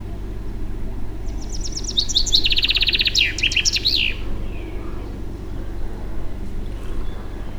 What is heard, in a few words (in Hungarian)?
erdei pinty; termeszetben